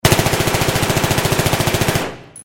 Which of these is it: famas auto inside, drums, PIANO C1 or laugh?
famas auto inside